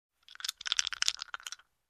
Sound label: Dice Roll